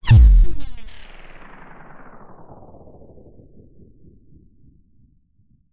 sound design sub drop slow motion slow down glitchy